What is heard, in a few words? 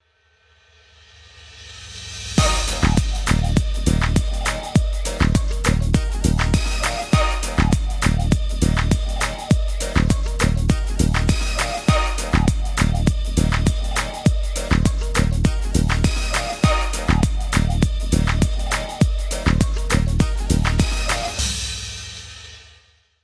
start round, music